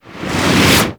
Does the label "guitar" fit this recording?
No